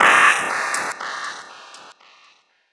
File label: Metal